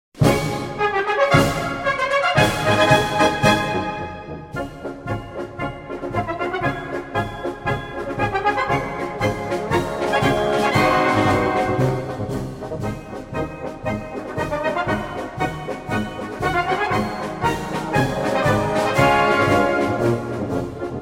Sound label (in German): alte kameraden ringtone